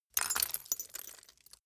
glass debris fall single

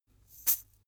chain mail march single right

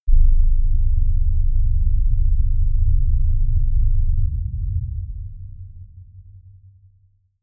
rumble

